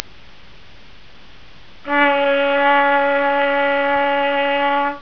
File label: trumpet